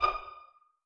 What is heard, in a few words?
STR PIZZ A4 PP; Sound On Sound - Peter Siedleczek's Orchestra